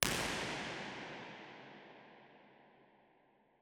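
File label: sports centre omni, impulse response; university york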